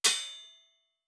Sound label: Blacksmith; Fantasy Sounds Bundle; Craft